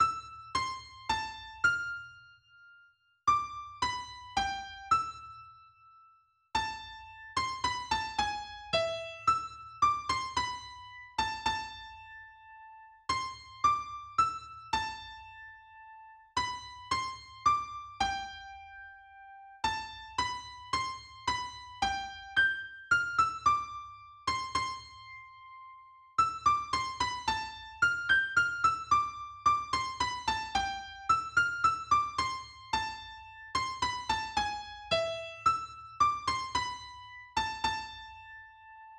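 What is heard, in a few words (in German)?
Menuett in A Moll Solo E-Gitarre; Krieger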